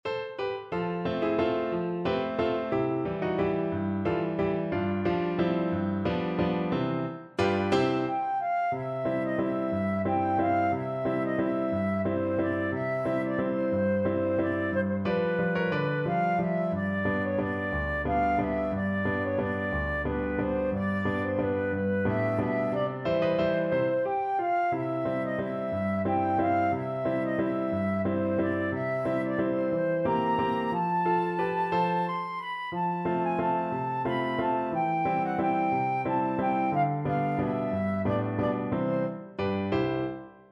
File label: flute, Mexican Trad.; chiapanecas